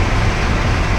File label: specter loop; nod